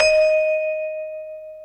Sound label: N Bell D#5